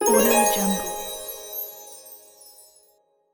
enchanted harp

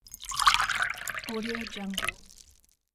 pouring liquid into glass; soundjay

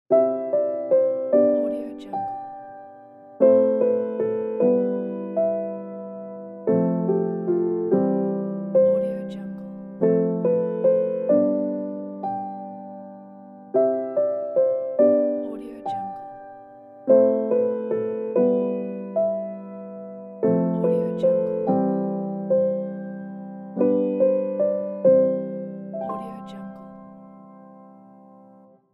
this is piano lullaby

